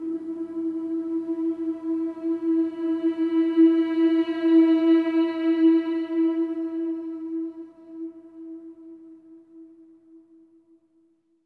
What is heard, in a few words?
MM E4; SWELL MMS